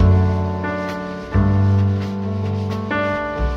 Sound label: piano, Loops, Sad